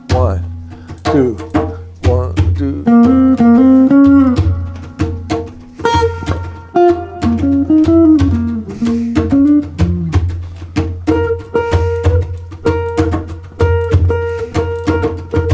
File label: accentuation blues; jazz; Mike Longo